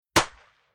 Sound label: Firing Range Gun Ak47 Single Shot Slapback Echo Urban Enviroment; Weapons; Rifle; Rifle Sound Effects